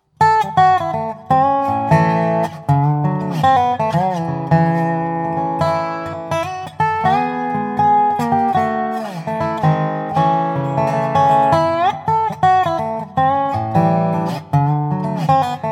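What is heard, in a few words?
Standard Maple, Custom Resophonic Guitars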